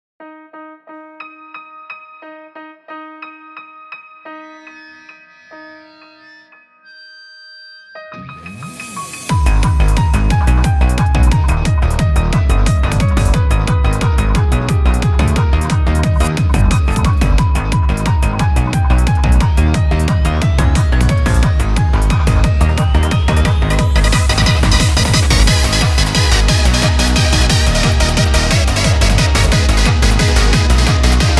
La Campanella Nu Rave

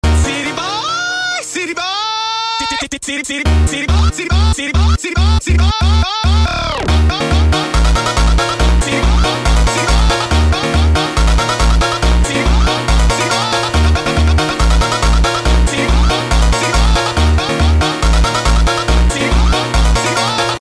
Phonk Music

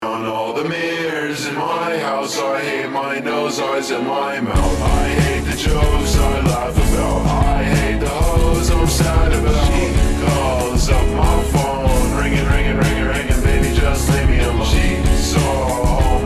slowed reverb

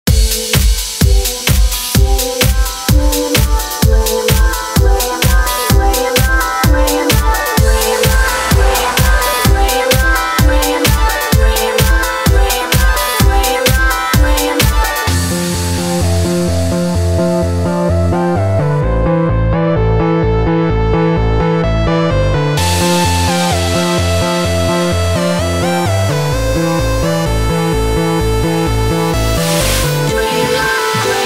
Electro

